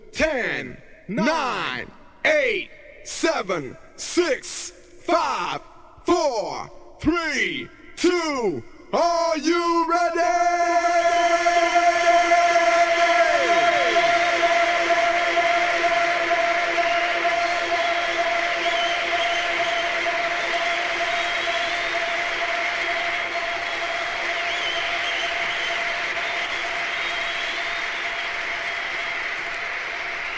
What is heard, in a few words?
Acapella